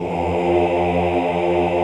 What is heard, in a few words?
OHH F1, Classical Choir; Best Service; Extended Classical Choir